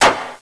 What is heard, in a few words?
grapple break